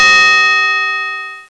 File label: bell; robocop